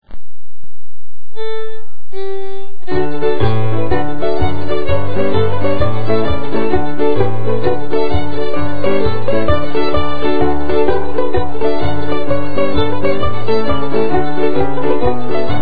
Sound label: tripping up the stairs and tenpenny bit jigs; ceilidhs